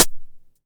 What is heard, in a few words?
909 HH Tape Sat